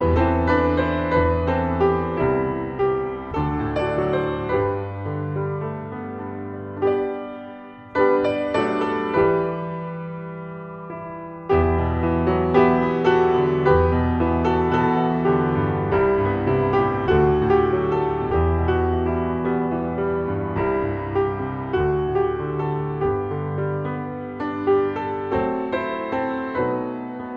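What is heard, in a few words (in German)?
Improvisation am Flügel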